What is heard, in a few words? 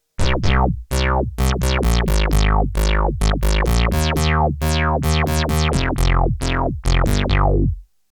Marion Systems MSR2 Wet Bass